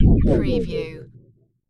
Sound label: Magical Reality Warp; magic spells fantasy; lynne-publishing